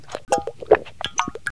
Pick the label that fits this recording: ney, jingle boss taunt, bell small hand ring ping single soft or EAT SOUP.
EAT SOUP